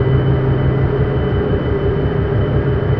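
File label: elevator shaft, ambient, atmosphere; Portal 2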